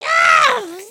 collapse

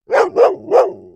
doberman bark

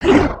run, creatures organic

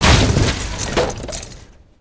racing wood smash; destructibles

